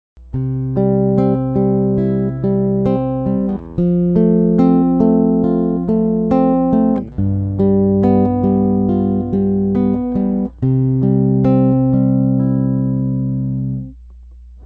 arpeg c, guitar

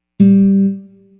tone g, guitar

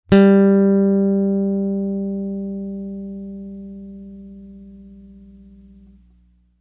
tune g3, guitar

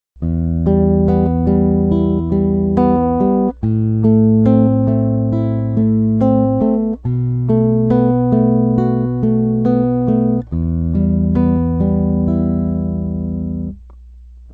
arpeg em, guitar

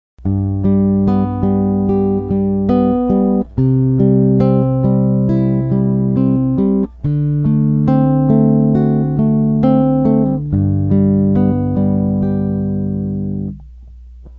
arpeg g

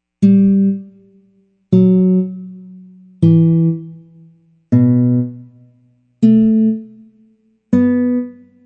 tone, guitar

